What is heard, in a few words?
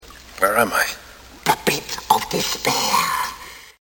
despair